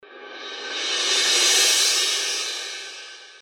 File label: Swell Example